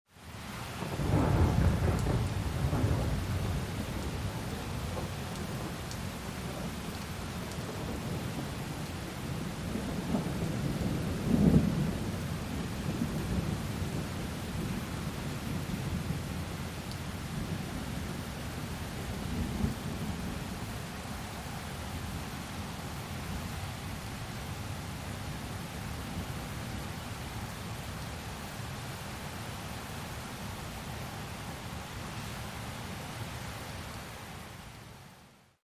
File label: Thunder Heavy Rain